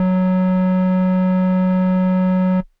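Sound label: Air Bass Kawaii F#2; keys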